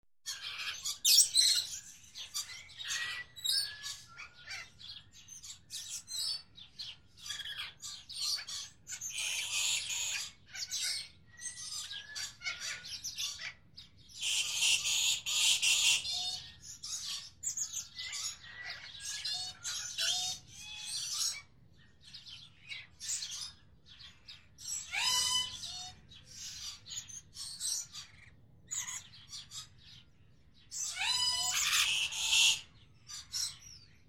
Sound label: Hatchling tweeting and chirping